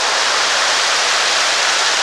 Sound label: Rain